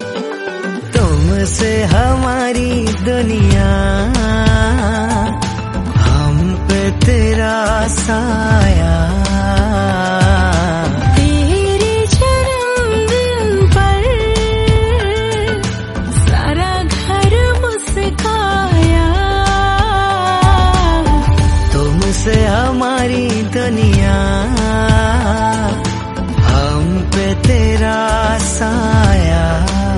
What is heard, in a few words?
fathers birthday song